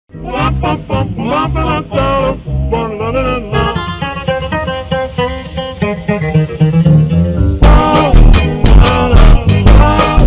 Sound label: funk slowed